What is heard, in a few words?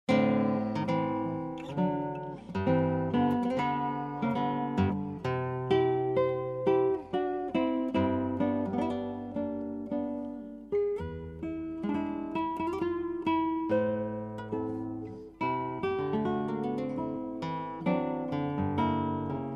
Mazurka en sol; Quattro Pezzi; Francisco Tarrega